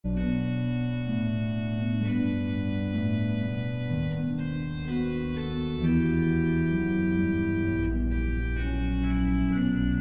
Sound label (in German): voxhumana tremulant